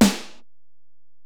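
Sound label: Acoustic Snare, Alesis DM-PRO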